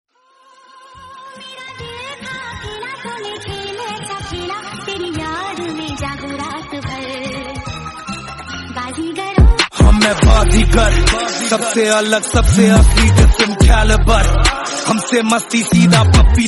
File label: rap song ringtone